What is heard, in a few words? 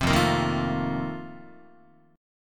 F 11th F, down, Guitar; F11 strummed